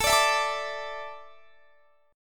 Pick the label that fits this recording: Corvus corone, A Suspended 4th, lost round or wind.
A Suspended 4th